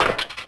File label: Glass Land, Footsteps; urbanchaos